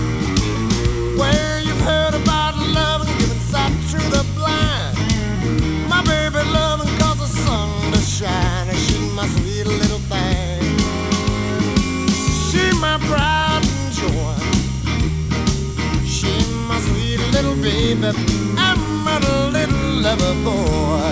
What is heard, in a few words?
blues